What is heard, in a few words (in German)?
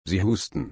husten